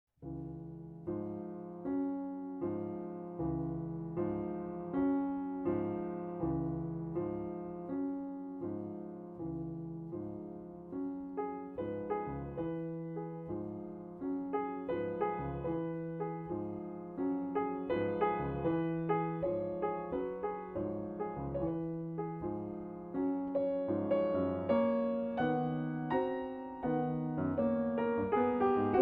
Preludes for Piano, Andante con moto e poco rubato; Three Preludes for Piano; Prelude No. 2